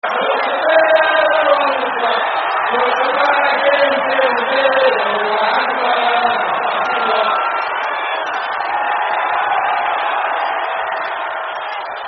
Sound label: Bonfire Birmingham